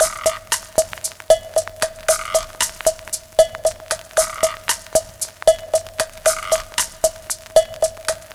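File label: drum 115, Bossa Back 115bpm, Breakbeat; Best Service ProSamples vol.40 - Breakbeat 2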